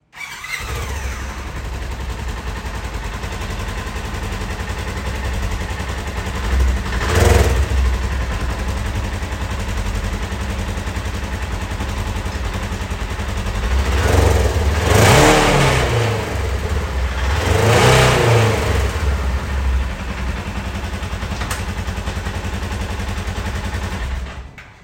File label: Polaris Ranger Stock